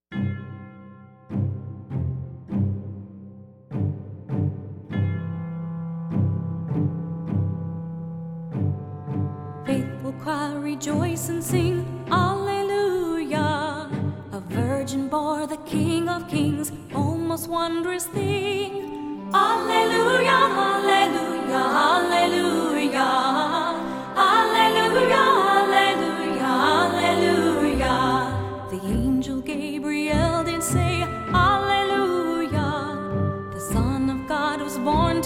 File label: Choir Rejoice and Sing; Faithful